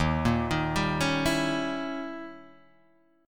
D sharp 7th Flat 9th, D sharp, down, Guitar, Open D